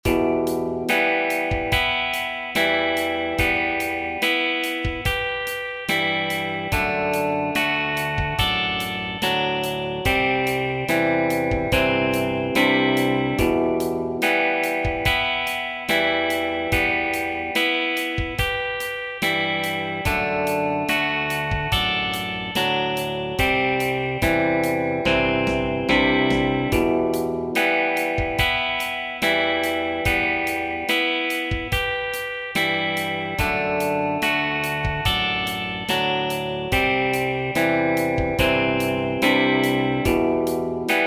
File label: Folk No Bass; Tryout track; 2027 MMEA conference All-State Collective Ensemble audition